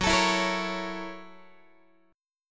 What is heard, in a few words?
F sharp 7th Sharp 9th, Guitar; F#7#9; x,14,12,14,14,12; strummed